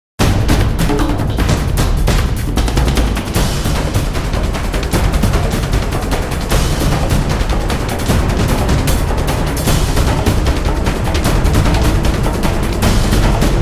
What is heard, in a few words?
Percussion